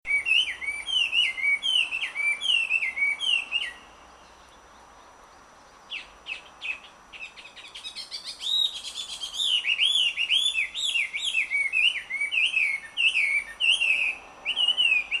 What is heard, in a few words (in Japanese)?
ガビチョウ